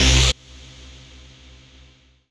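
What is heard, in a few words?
Rave Synth, Dance Mega Jungle Rave; Best Service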